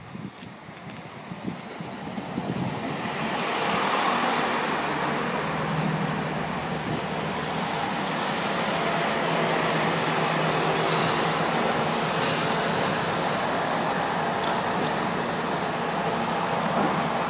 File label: getting on a train at cholsey station